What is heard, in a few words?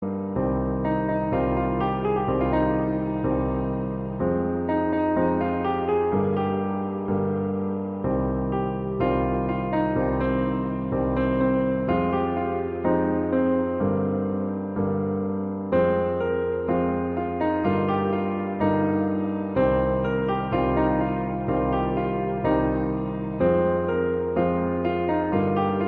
Instrumental Music Ringtone